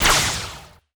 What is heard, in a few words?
plasma weap pist